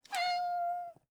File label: kitten meow; incredible-race